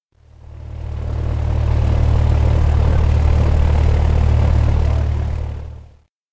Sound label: far motor; anemoi